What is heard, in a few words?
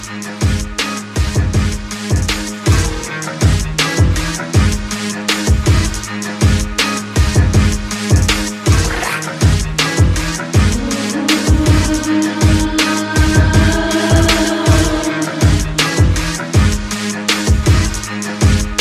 romantic english ringtone